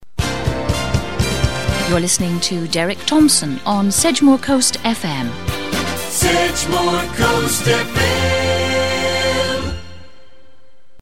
jingle

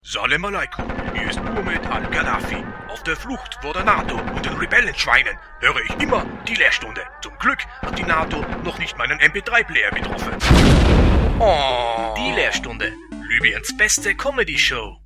jingle; lybiens beste podcast show

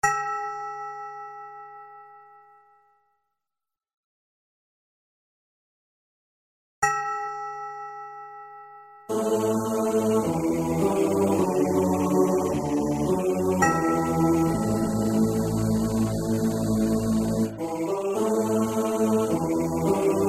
choir percussion bells; Magnus Choir; Organux VST plugins virtual instrument

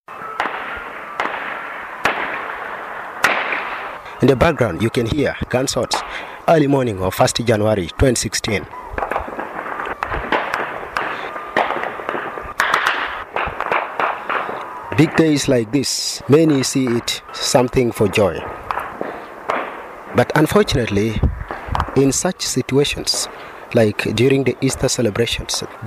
random gunshots on new year eve; Yei

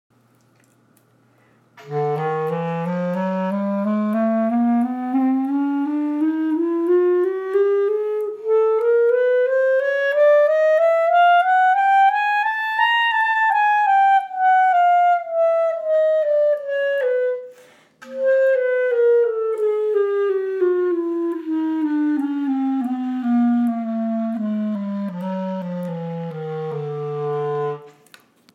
chromatic scale

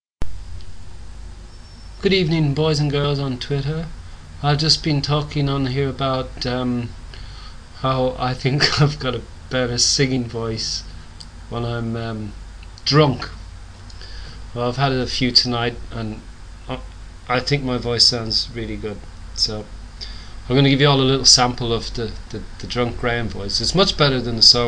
drunk singing

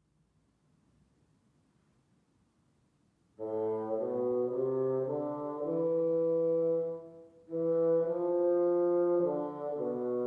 bassoon studies; julius weissenborn

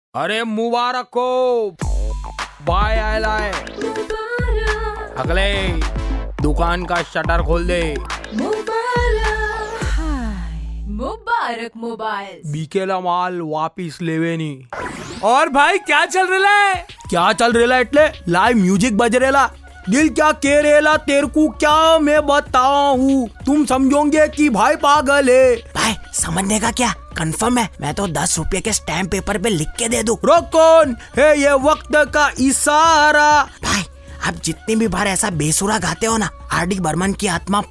pub

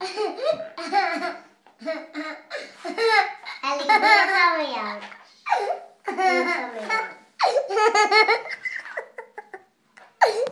3 yr old laughing hysterically

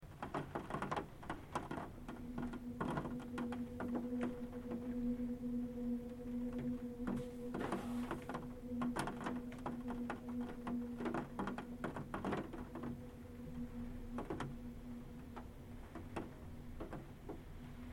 Beetham Tower Manchester howling

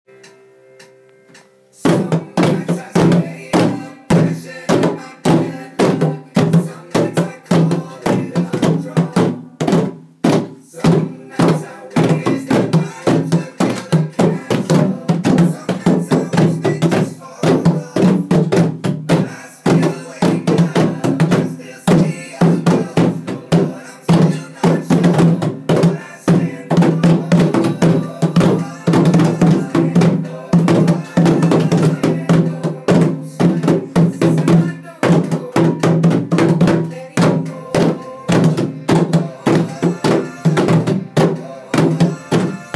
rhythm composition

